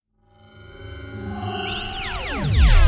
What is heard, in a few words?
Sms; Transformer